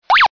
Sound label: warning